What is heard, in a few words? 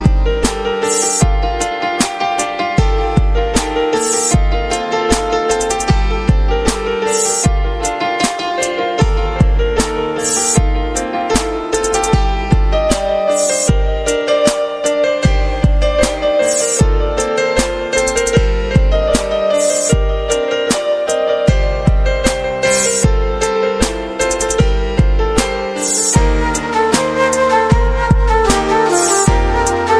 instrumental